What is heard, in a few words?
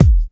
thumpy kick sample g key